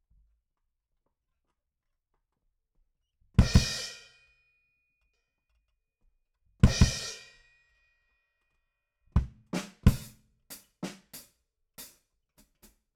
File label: BD Out